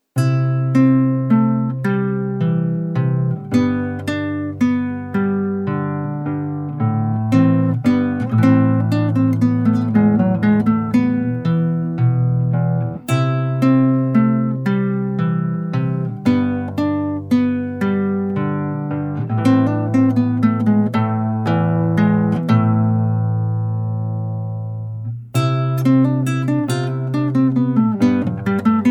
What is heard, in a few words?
Guardame Las Vacas, Solo Guitar